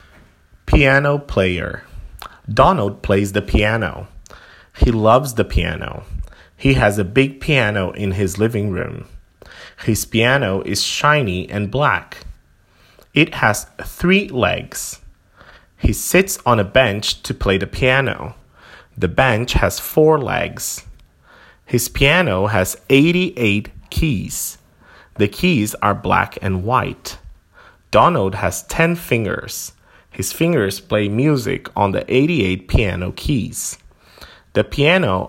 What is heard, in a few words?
Piano Player